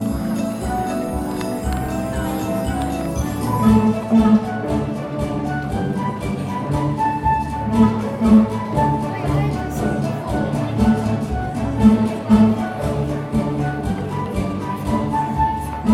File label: music concert